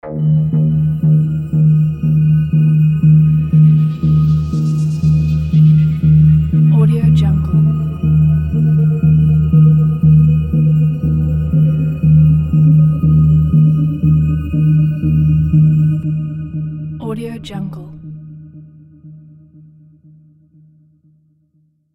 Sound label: suspense atmosphere